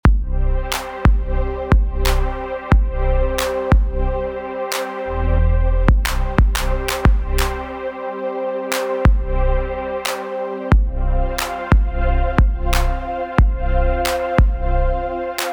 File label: loop drums; windows